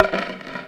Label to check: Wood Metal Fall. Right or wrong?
Wrong